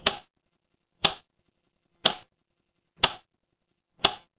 mechanical switch

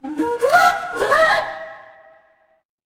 ui app open

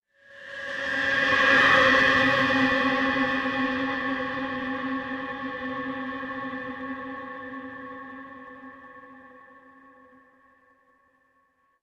horror stinger

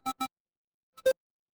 retro game coin

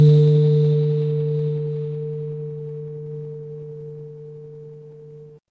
fire electric hum

